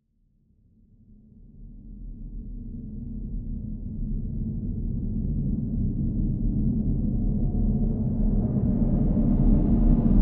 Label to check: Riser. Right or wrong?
Right